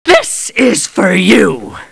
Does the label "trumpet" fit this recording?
No